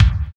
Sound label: KICK, Tekno Industrial; East West